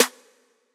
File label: snare808